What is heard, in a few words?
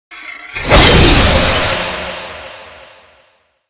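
rock attack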